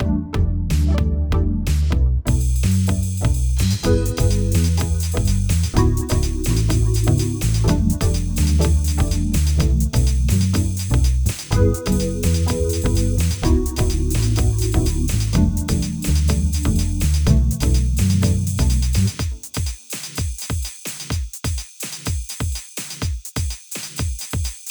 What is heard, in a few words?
soft dream without click 125bpm